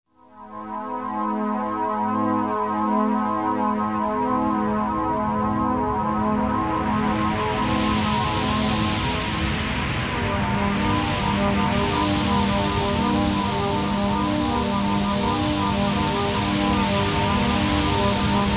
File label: Mysterious Synth Reprise; Halloween; Hallmark Halloween 1989